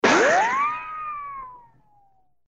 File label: ricochet, weapons